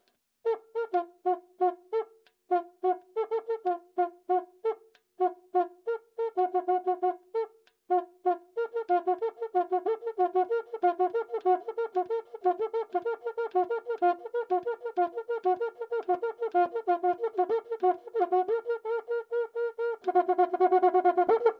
Cuica improvisation

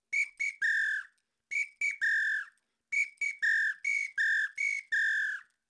Apito base

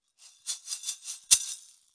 Ganza double

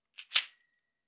Chocalho

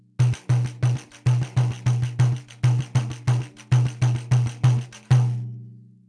Tamborim

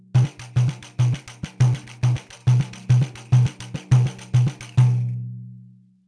Bossa nova, pandeiro

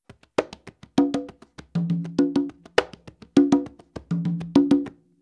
montuno, congas

